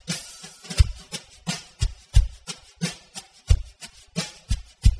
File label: drum